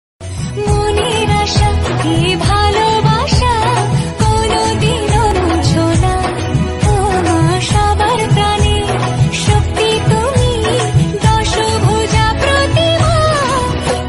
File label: Bengali Durga maa ringtone, Bengali bhakti song